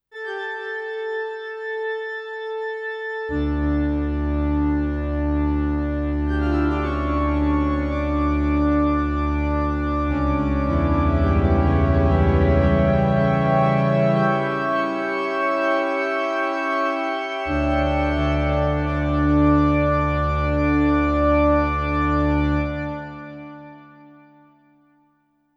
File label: church organ